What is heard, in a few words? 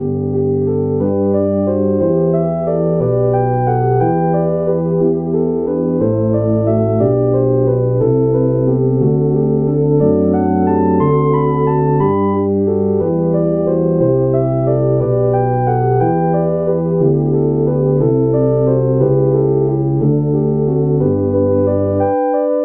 tuba